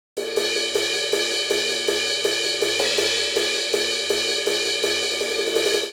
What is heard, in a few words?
Punk Rock Cymbals Only 162 bpm; 4 4; MIDI Clips; Ableton; Factory Packs; Drum Booth